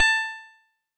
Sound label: Ping coin; gridtracker